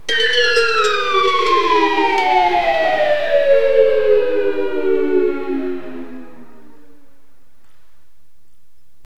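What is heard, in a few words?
instruments fall down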